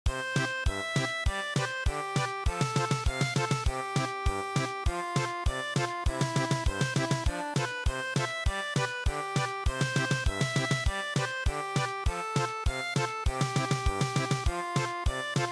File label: German Polka